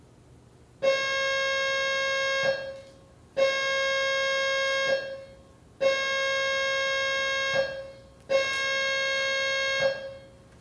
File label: All Clear Successive Long